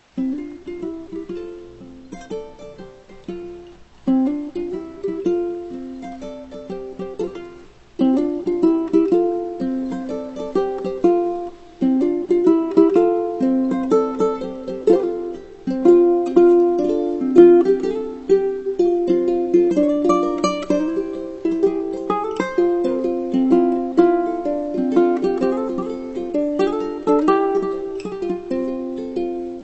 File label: Ukulele Okinawan